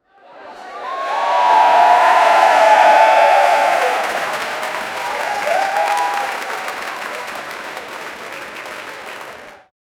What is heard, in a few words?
heavy cheering applause, hall